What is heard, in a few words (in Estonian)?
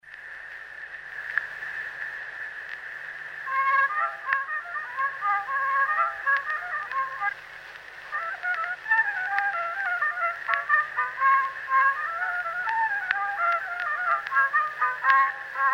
Pirupolka Kuusalu viiul folk; Eduard Aaman